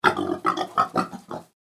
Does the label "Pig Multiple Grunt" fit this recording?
Yes